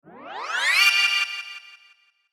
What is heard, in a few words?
Shimmer Ascend Delay; Ascending; Blastwave